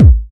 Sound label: perfect kick drum d key